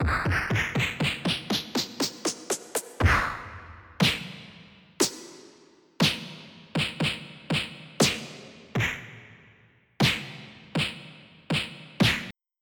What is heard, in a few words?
punch; Populous Demo